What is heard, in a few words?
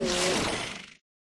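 pterodactyl wound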